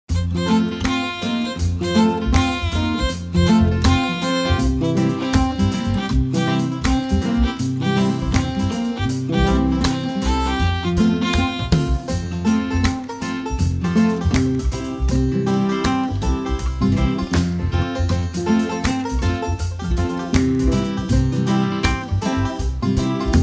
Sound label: weather karaoke